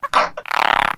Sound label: antlion grub die